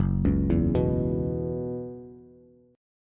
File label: F sharp 7th Suspended 4th, down, Bass; F#7sus4 arpeggiated